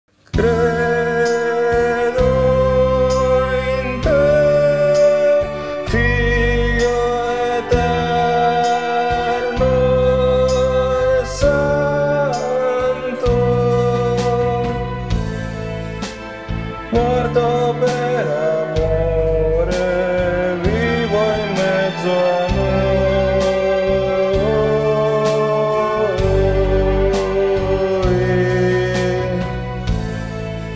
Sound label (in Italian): SOPRANI